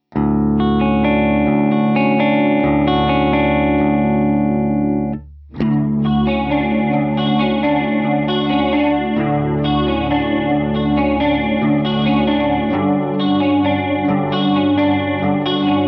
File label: slow and wide